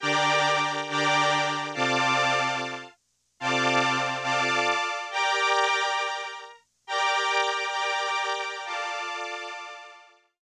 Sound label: crumar strings